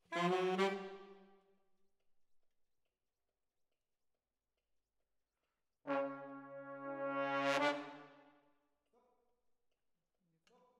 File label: Brass Over